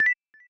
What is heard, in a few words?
UI Two Note Up; gameflow-deck